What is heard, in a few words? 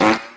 explode; bark fart modpack